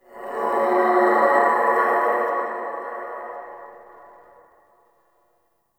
Covered Vine Area Tube Scream; Ambience; Misc Outdoor; Warframe; Infested Eris

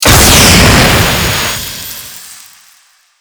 Liset Cycle Engines Rand D; Tutorial

